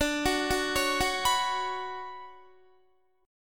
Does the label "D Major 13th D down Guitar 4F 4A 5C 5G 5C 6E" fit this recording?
Yes